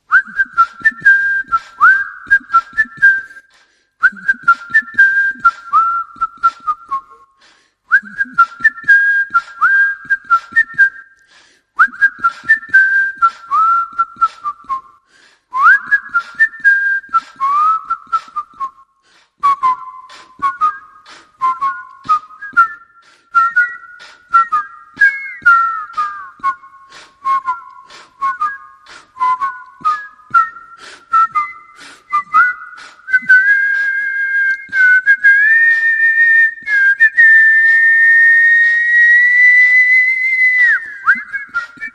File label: Child Whistle, Whistle Only